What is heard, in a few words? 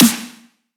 Snare